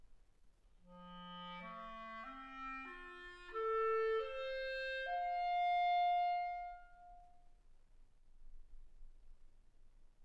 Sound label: clarinet